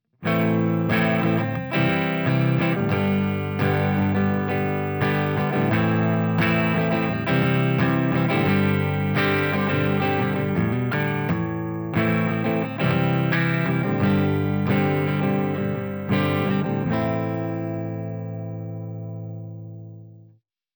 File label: clean neck rythm gibson; vintage